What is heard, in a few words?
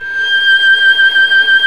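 strings violin, chamber harm; String Master Series; Combos 4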